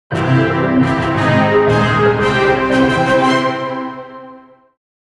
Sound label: Mission Complete Jingle; Metroid Prime Federation Force